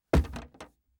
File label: Door Impact